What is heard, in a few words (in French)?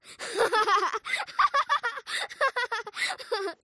Chauve Souris Rires; mirumiru